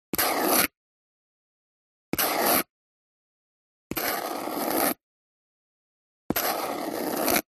Handwriting Dip Pen Short; D&H; soundbox library

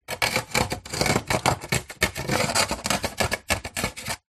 household freezer ice scrape from plastic shelf

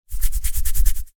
human itch scratch body skin

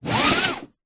car seatbelt pull nissan patrol; zapsplat

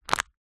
household plastic cup disposable handling pick up

